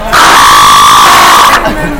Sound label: notification sound; multiplayer